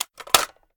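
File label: weapon foley assault rifle reload empty mag out; weapons; gmod; viper; scharlie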